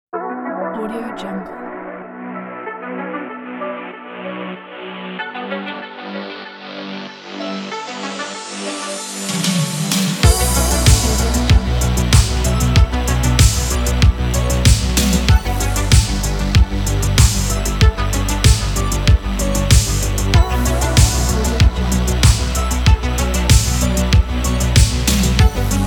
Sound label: 80s synthwave retro